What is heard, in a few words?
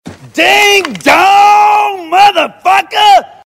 ding dong